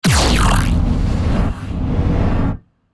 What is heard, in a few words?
Low Designed Cyber Crunch